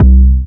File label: 808 knock C, Tonal; Hip-Hop; Slate; CAICOS Hip-Hop Sample Pack